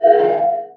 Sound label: Magic